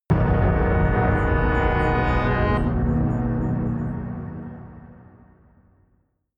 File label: reversed piano hit